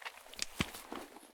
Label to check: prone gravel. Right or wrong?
Right